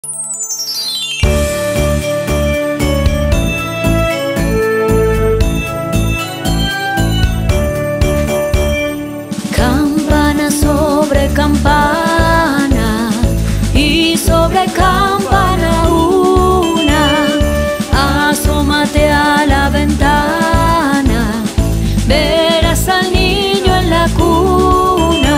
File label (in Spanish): Campana Sobre Campana Villancico; villancicos navidad